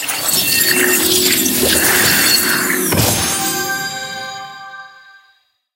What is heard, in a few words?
box normal open